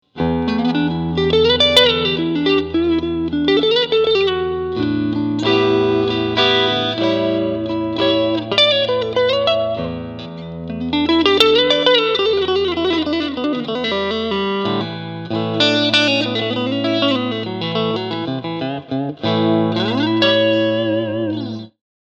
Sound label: EHX Dirt Road Special Tone Bite Hall